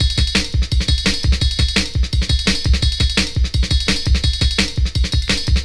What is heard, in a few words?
Drum Break, Drum & Bass; Fluid Dynamics